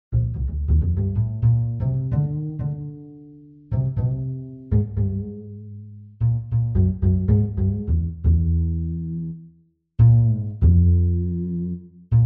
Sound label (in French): équilibre intro